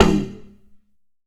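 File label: FX TALKING DRUM; AKAI S-Series CD-ROM Sound Library VOL-5; FX PERC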